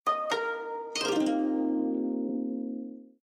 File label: Calligraphy Logoff Sound, windows 7